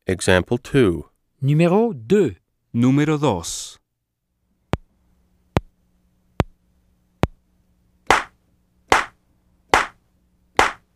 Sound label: Keyboard